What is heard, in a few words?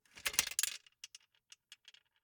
Gumball machine, metal, glass, candy, switch, mechanism, rotate, dropping, single, rattle, hyper; Eiravaein Works; Vaeyan IV